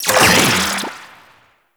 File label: Shock Rifle Primary Shot 3D, Weapons; Warframe; Corpus; Shock Rifle Vandal